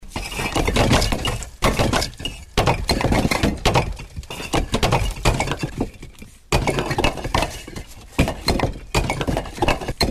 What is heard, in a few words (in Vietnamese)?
tiếng bốc sắp xếp các viên gạch trong xây dựng